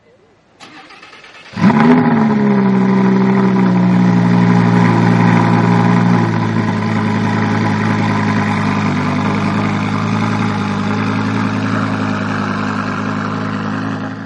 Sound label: lamborgini aventador car exhaust sound